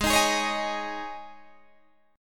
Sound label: Ab Suspended 4th, Guitar, DADGAD; 18,18,18,18,18,18; strummed; JGuitar